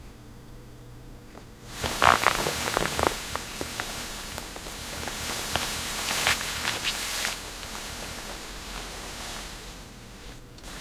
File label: sound of a person suddenl, fabrics